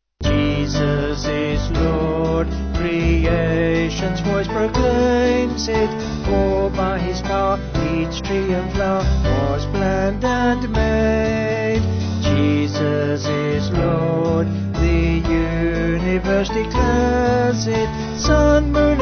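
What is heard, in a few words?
Vocal Band, Music Backing Files for Churches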